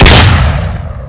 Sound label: shotgun fire; XDM